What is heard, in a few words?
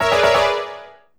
ENTRY ERROR; maimai